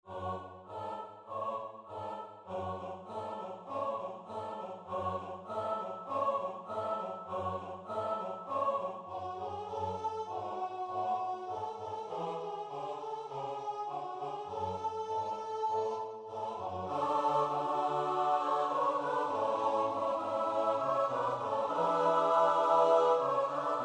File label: a capella; Jesus Calls Us